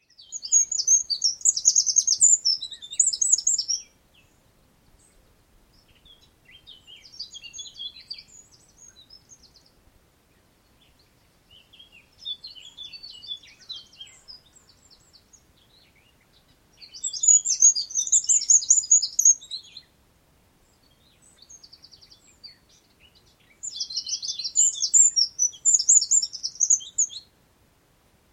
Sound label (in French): Troglodyte mignon Troglodytes troglodytes